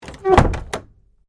Door Close Cellar, Foley, Door, Close, Cellar, Door, Wood, Planks; Miscellaneous Door